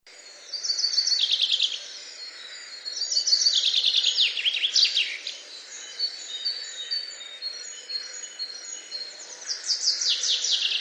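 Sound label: Ziablik / Chafinch, chaffinch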